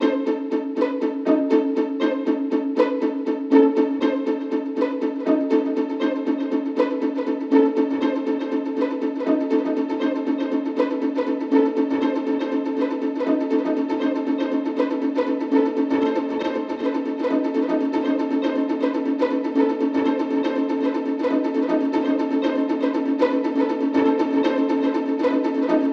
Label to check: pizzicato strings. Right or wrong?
Right